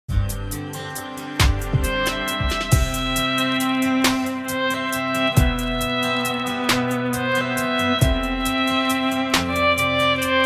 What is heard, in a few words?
Violin Cover